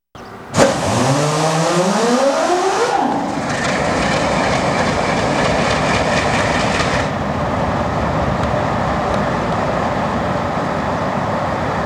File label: startup